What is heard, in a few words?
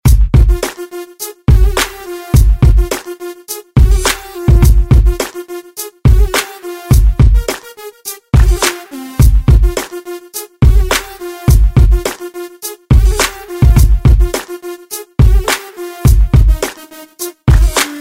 Free Beat